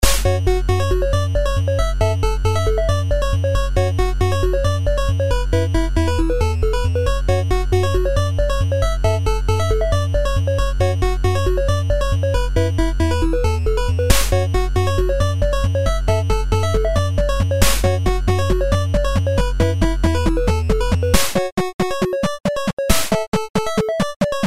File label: techno loop